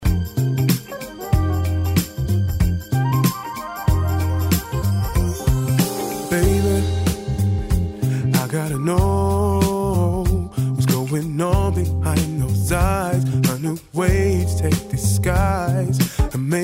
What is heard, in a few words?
Late Night Jazz